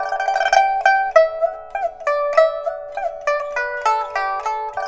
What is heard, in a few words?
PIPA, 90; INIS - Opium